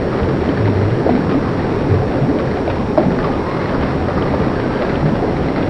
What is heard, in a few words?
AMB; Wanted